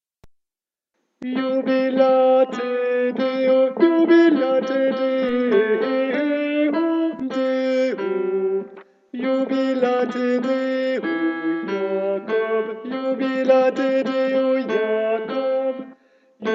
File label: tenor; jubilate